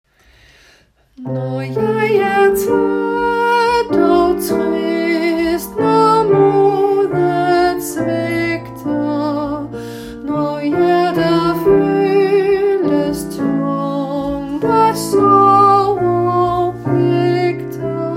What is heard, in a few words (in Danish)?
Sopran